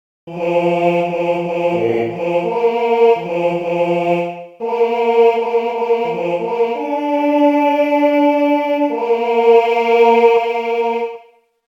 Zbiorka mysliwych, sygnaly mysliwskie; sygnaly mysliwskie ze slowami

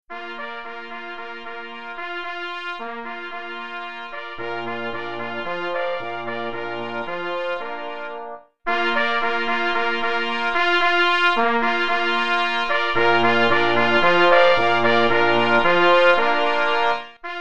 W lowisku 3 plessy i 2 parforsy; Cz. Trybus; wersja oryginalna

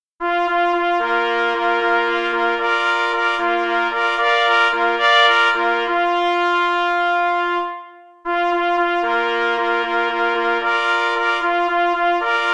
Na powitanie gosci na 3 plesy; Cz. Trybus

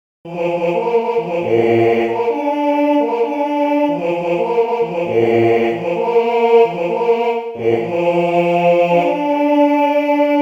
Drapieznik na rozkladzie, sygnaly mysliwskie; sygnaly mysliwskie ze slowami

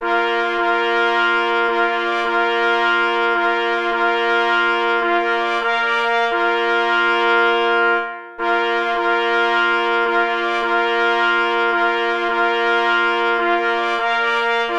Polski spaniel mysliwski. Sygnal na 3 plesy; M. Kalka; pies w muzyce lowieckiej

